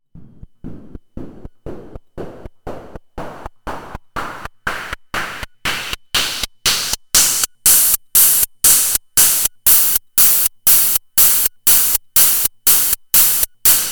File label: twin VC Filter LP Filter